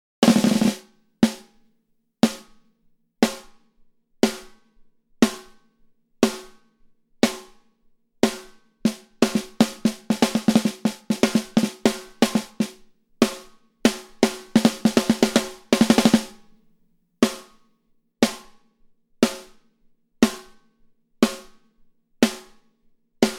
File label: snare mattertone off